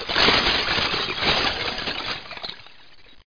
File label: GLASS W